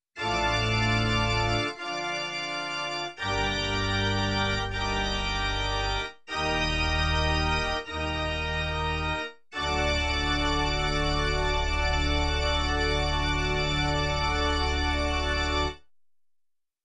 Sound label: Varying Organ Chords